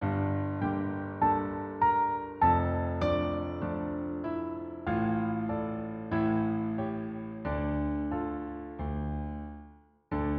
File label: Nana instrumental